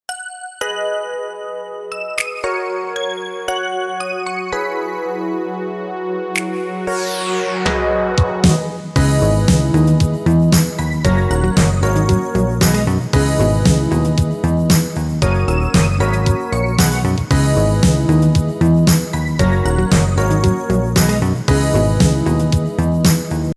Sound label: funk synth